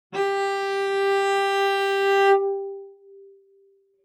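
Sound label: Cello arco fortissimo sul A G4; MIS Pitches - 2014; Strings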